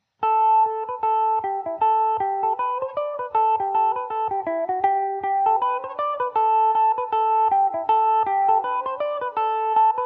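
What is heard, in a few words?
reel, A dorian, Irish mandolin; abbey reel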